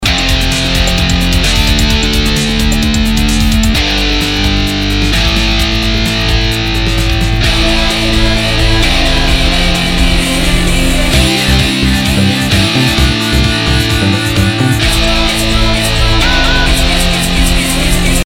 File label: Pop